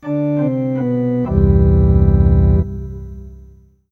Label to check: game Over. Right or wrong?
Right